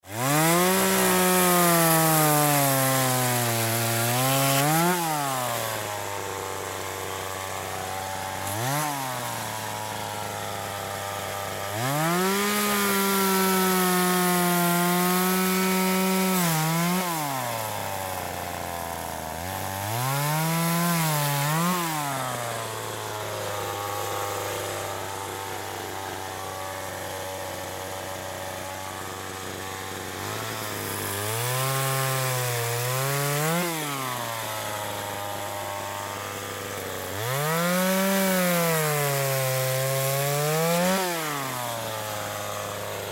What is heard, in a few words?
Saw Electric Rev Multiple; Saws; Machines; Industry; Sound Effects:Machines; Blastwave